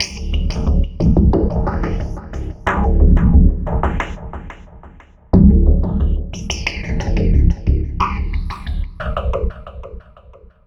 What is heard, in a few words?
Remixed Acoustic Bass